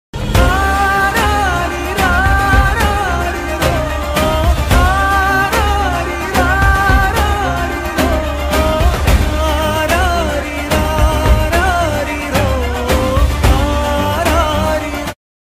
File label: movie bgm emotional; kanaa movie